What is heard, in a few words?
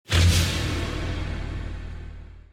impact hit